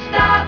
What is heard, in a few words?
Carpenters Error; 250 Desktop Themes